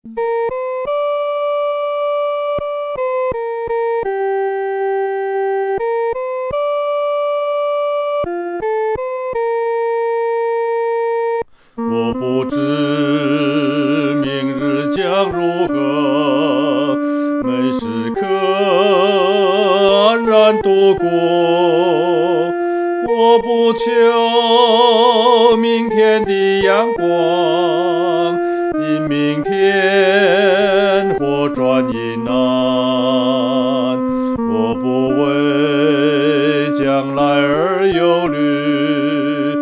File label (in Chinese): 独唱 第一声